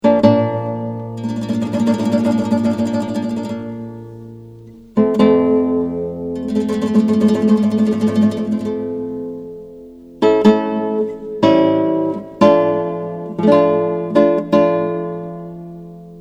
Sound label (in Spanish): Marcha funebre